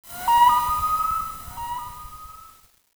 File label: loon call